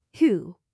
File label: tiger